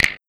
Snap; pitchglitch samples; Syrin; kmixsound

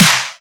Snare

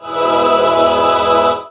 Vocal Choir E

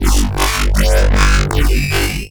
Medium Cute Transformer

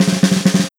Snare Alt Loose

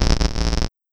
Noise Scratch

